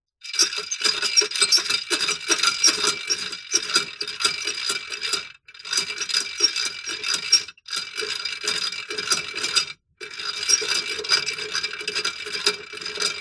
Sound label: office classroom pencil sharpener old fashioned metal clamped turn squeak